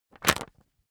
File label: magazine skim hard